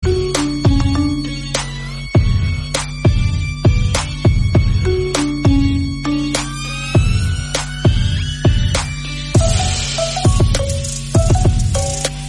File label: marimba remix